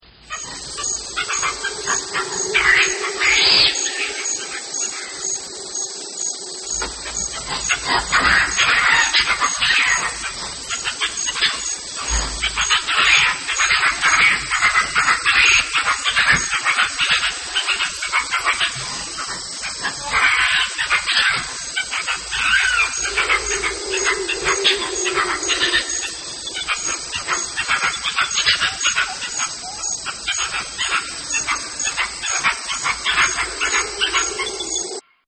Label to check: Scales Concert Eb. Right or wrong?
Wrong